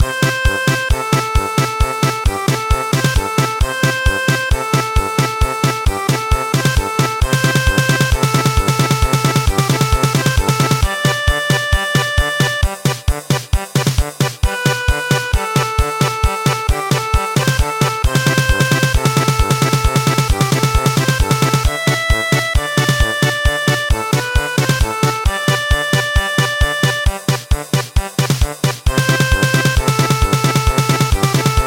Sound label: German Polka